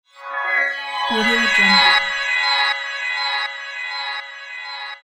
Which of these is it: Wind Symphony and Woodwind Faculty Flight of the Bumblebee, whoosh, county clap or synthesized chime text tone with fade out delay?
synthesized chime text tone with fade out delay